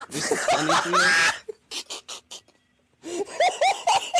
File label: laugh